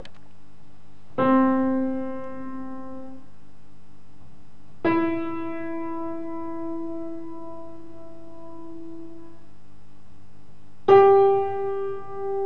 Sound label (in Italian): pianoforte